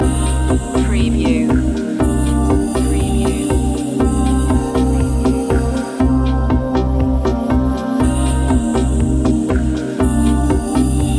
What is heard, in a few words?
downtempo sunset loop end